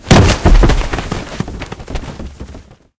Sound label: sandbag crash